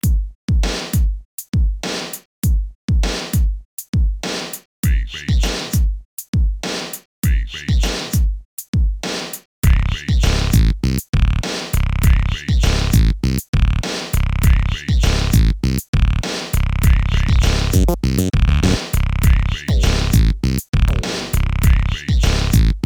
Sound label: bass bass; Fruity Ass Loops